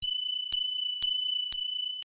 bell

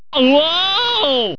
banjo

